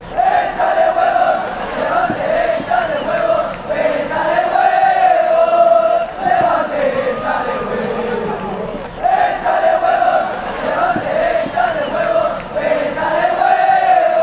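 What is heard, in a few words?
fanchants, football chants; echale huevo; levante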